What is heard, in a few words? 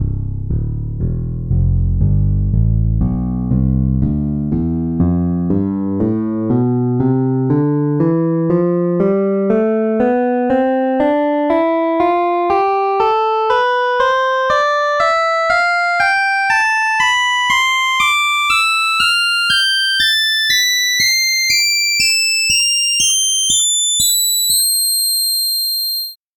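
fingered bass scale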